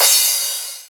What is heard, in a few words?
Crash, Drums Cymbal